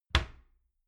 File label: door wood close regular